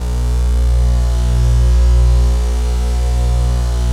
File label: ATMO PADS; Best Service; XX Large